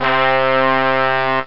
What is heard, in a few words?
brass swell; OctaMED SoundStudio V1; jazzies samples